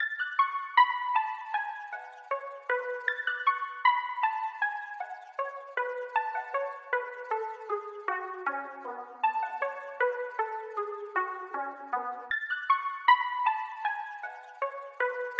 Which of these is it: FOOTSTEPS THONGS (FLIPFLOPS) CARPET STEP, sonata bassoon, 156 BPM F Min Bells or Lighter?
156 BPM F Min Bells